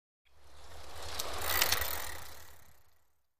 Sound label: Biking Bike By Spinn